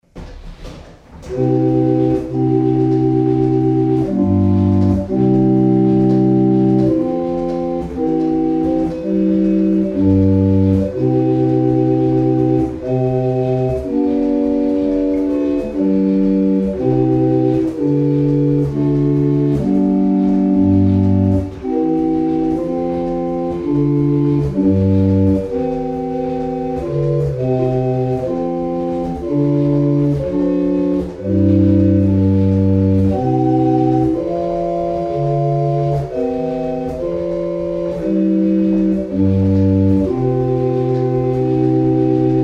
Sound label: barrel organs; Boxford; Exeter